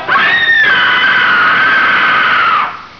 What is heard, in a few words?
scream